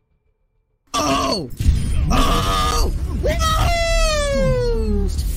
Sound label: wooo